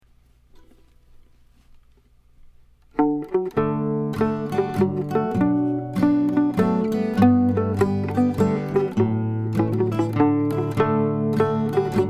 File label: mazurka session; sonnys